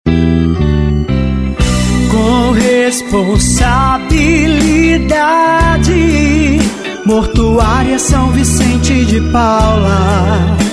jingle mortuaria

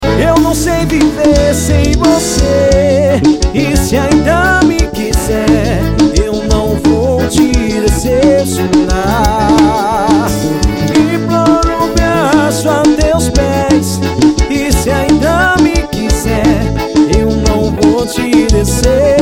forro ao vivo

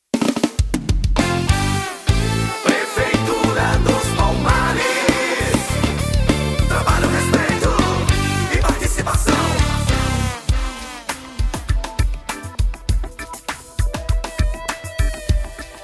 vinheta cantada

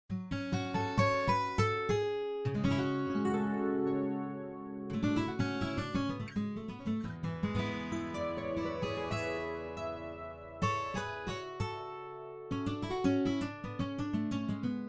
violao classico

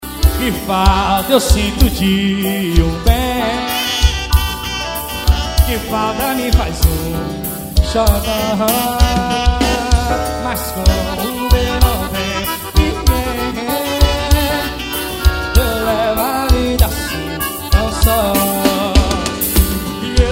acordeon

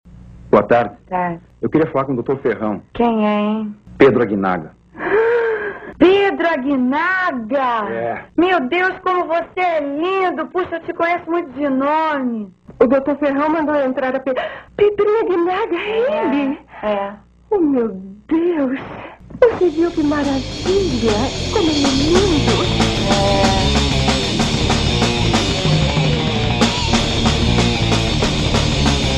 com vinheta

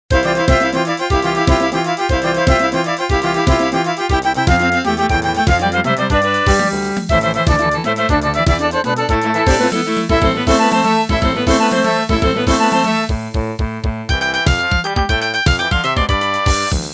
flauta doce um baiao

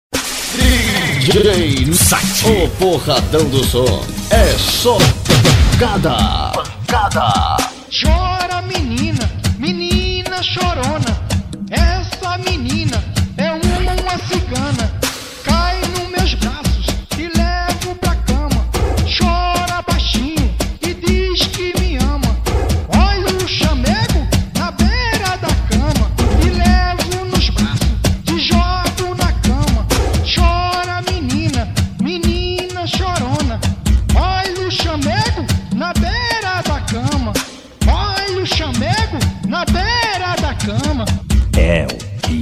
funk novo

